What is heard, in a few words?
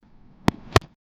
only 1 catch effect, football